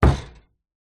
impacts wood thump with metal wire rattle

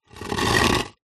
foley concrete block move drag hard ground; zapsplat

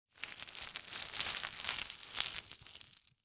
ICE Thin Manipulated Debris Rustle

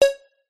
multimedia game sound synth digital tone beep

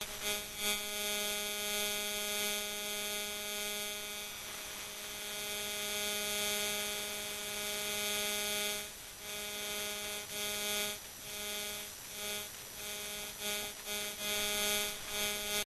static interference noise loop; the sound pack tree

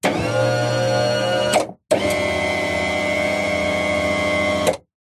hospital bed electronic motorized lift whirr

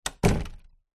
household door backdoor close; zapsplat

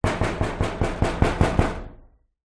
household knocking metal garage wall

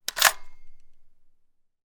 weapon gun ar 15; still north media

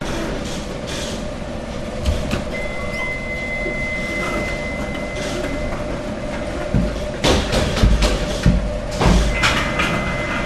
German Bakery Bakers Working Loud Beeping

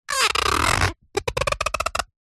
food pear rub squeak

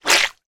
cartoon wet squelch

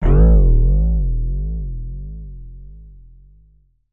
comedy spring twang pluck; sound effects one

